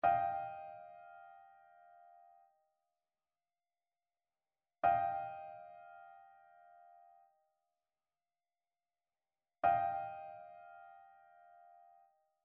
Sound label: trichord; trichord identification